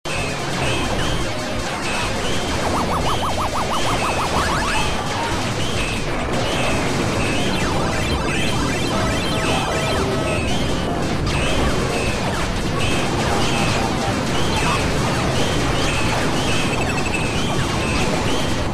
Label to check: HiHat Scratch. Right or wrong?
Wrong